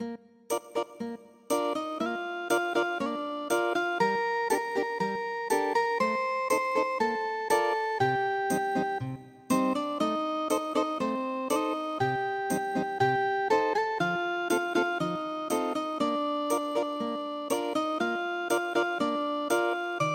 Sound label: ethnic guitar; SUMMER EVENING